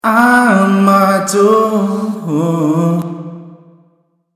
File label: drop